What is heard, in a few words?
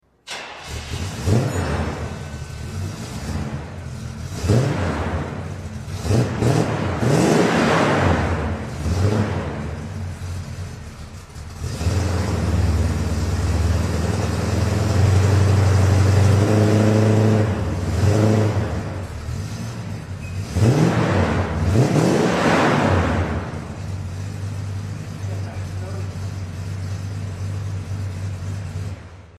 Buick Electra 455cid Super Turbo duals Xpipe, exhaust sounds